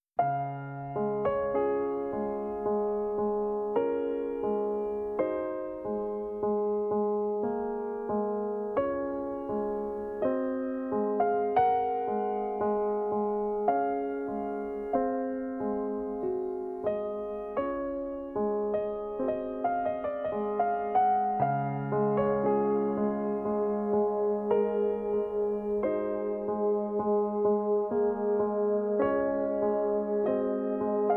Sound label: Prelude in D flat major; Risk of Rain 2; Survivors of the Void